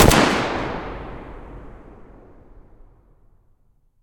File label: galil muzzle mono distant